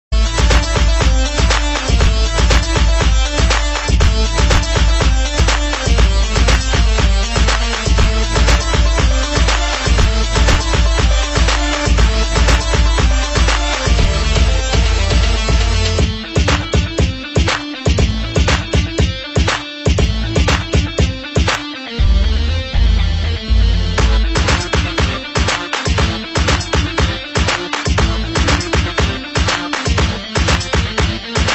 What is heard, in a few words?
instrumental ringtone